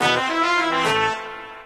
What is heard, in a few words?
Baseball Jingle Sad Short